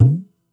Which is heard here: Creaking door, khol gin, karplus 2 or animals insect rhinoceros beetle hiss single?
khol gin